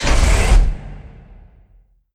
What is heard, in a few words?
Sliding Door